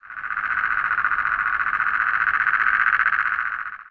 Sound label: antlion hill ambient, coast; hl2